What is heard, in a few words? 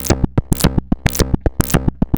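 rhythm loops, 110 bpm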